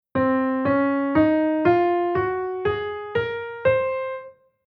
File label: Chord mode C Locrian